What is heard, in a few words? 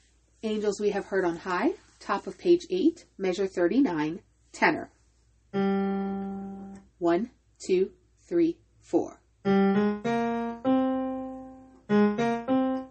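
tenor, study tracks; angels concerto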